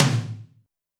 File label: tom, Zoom MRT-3B